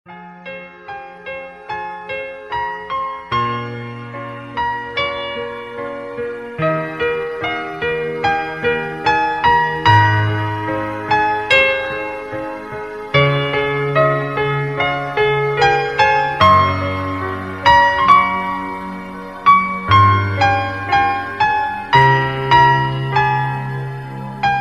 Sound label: piano i phone 6 s ringtone